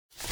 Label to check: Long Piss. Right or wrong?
Wrong